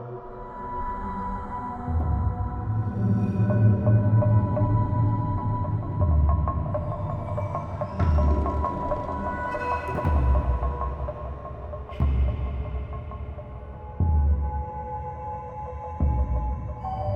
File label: ambience general; rise of maya